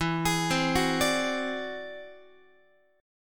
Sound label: E Augmented 9th E down Guitar 4A 4E 5A 5D 5G sharp 6C sharp; arpeggiated